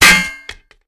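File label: bullet impact metal target; Modern Warfare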